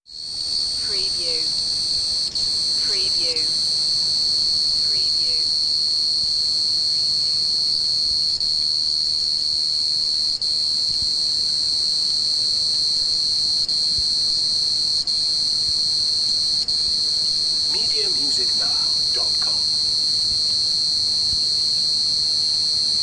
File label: Outdoor Evening Ambience